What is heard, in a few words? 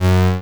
zap, square waveform; Square Waveform sfx